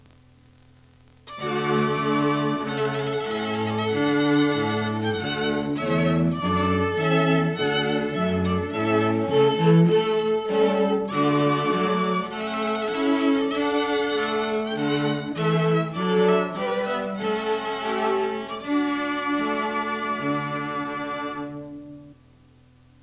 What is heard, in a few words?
Trumpet Voluntary